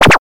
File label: error; math